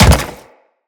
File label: Step Default; Grineer Leto